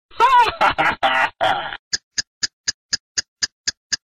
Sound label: Creepy Laugh